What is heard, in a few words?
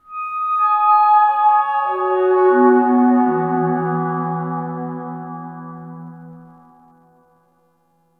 Cascading Fifths Flower Structure; Tau; Flower Farm